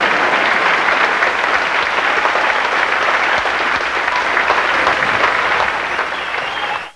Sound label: Applause; Digital Video Editing Workshop; DVCAM; VidWave III; VideoWave